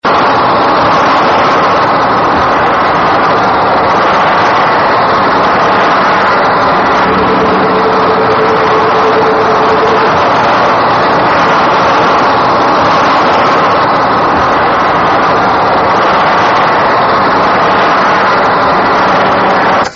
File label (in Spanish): Buque de guerra diesel: hélice girando a 122 r.p.m.; subacuatica